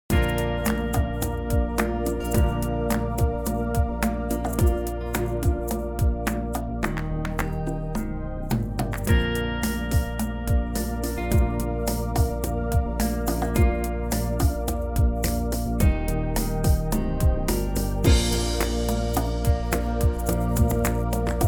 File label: corporate video